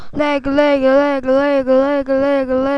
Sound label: laugh